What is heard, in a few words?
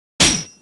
Hit; Barbarian